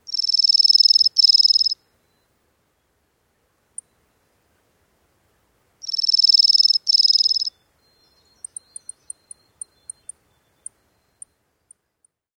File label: DEJU song and contact